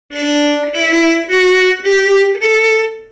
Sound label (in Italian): portato